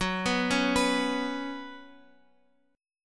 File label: F sharp Suspended 4th, Guitar, Open F; arpeggiated; x,x,6,6,4,6